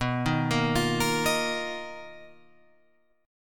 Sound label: B Major Sharp 11th, Guitar, Open F; BM#11; arpeggiated; JGuitar